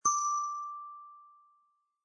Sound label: tips show; croods